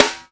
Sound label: WOLF DRY; Ensoniq ZR-76